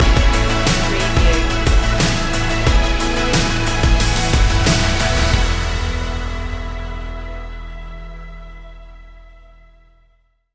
spread your wings stinger

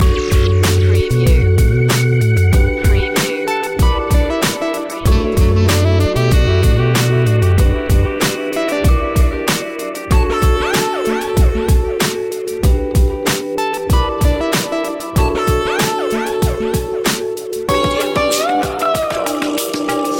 hop to da beat loop

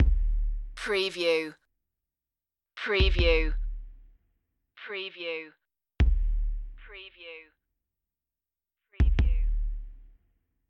deep space loop a

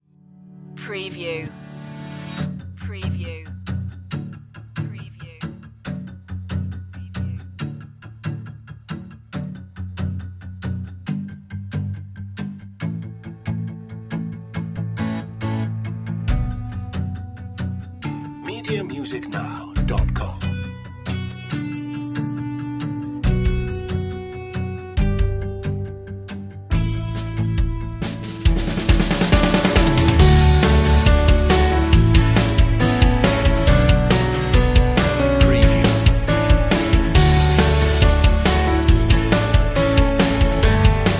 special day upbeat corporate rock

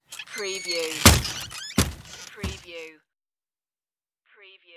saloon swing door

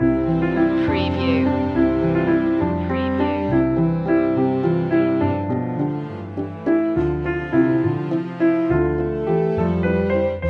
hey diddle diddle instr loop

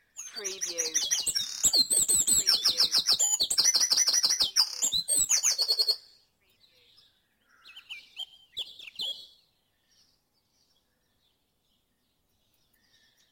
Dawn Chorus in Mold North Wales - Short Loop; beanstalk audio

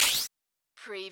CARTOON CHARGED

